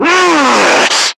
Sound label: Screamer Pain; Warframe; Lasrian